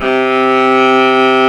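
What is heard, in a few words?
STRING SECTION C2; WS#3 BAROQUE; AKAI S-Series CD-ROM Sound Library VOL-2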